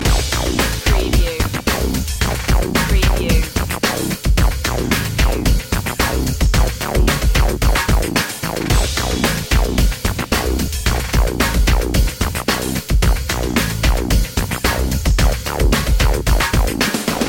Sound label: underscore